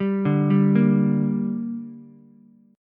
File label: D Suspended 4th Sharp 5th, Ukulele; arpeggiated; 0,2,3,1